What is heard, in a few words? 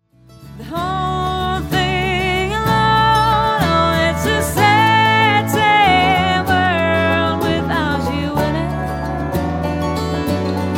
banjo